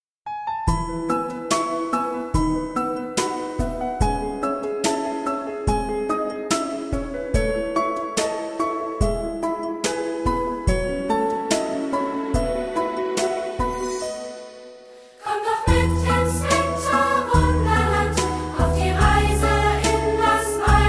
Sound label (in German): Lied